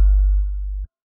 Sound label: sonar Tail Suit Close Beacon; Barotrauma; Real Sonar